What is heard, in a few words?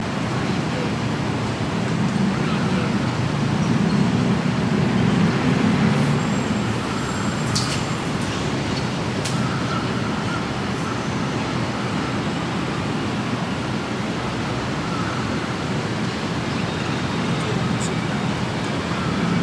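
ambience Tokyo city distant traffic loudspeaker Japan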